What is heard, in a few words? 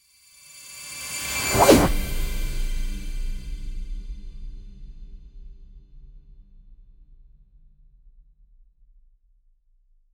sound of a magical spell, energy build up